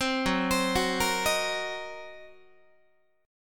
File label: F sharp 6th Flat 5th F sharp down Guitar; F#6b5; 15,14,15,16,14,14; arpeggiated